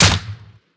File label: ak74u attack silenced; Rust